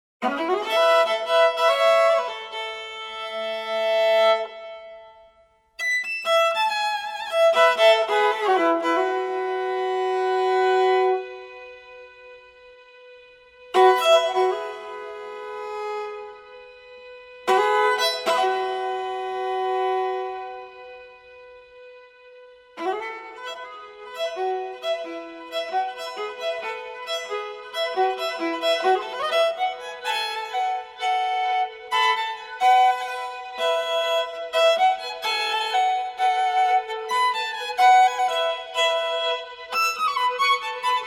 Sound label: Riff